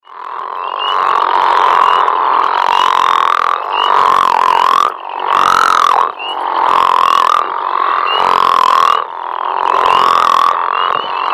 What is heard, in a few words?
frog, Call a loud, deep "snoring hog"; Lithobates areolatus; Subspecies: Southern Crawfish Frog (L. a. areolatus)